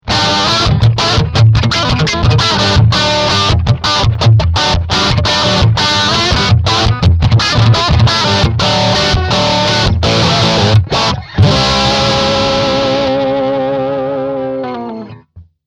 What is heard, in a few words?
Bridge Humbucker Heavy Crunch